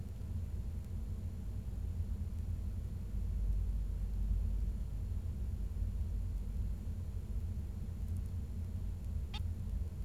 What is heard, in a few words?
bengalese finch